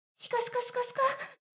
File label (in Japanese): メイド シコシコ音声; ASMR; ダメなメイド